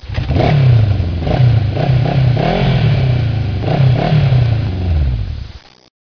sonidos de motos

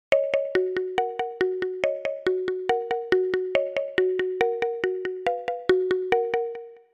sonidos de telefonos antiguos

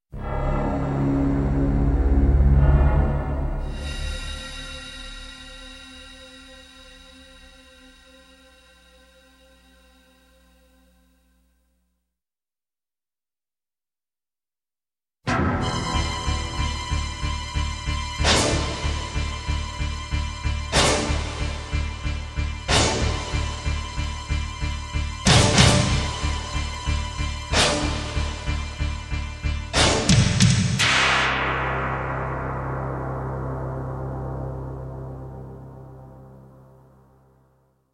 Musica de suspense y misterio; EFECTO DE SONIDO DE AMBIENTE

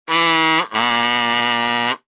zumbidete whatsapp tono movil; SONIDOS WHATSAPP

